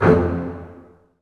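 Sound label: Bass Spiccato F1; Digital Sound Factory Virtuoso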